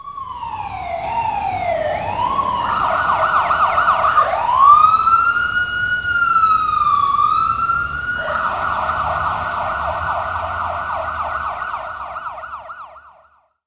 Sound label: police siren; genesis